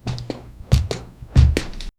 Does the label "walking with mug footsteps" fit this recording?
Yes